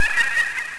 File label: Child's laugh; Curse of Dragor